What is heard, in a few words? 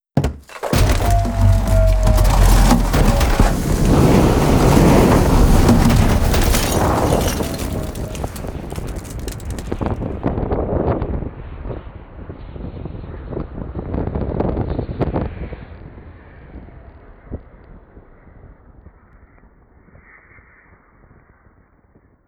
intro crash